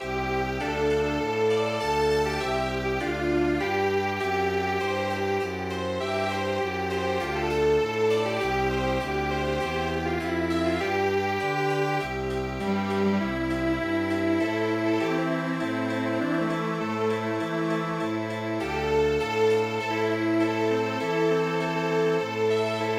Chamber Music

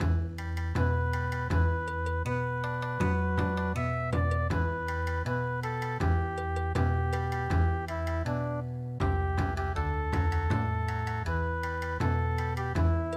Bon Odori, midi

